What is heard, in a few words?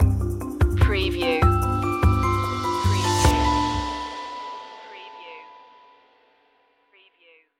flying home stinger